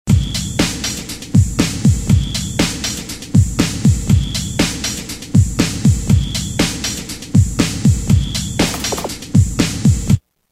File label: Superfly 120, Roland M-DC1